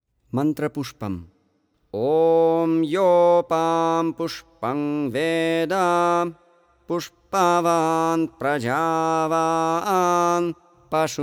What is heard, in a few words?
puja mantra pushpam; atmartha puja